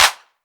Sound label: 808 Clap; SPD One Sounds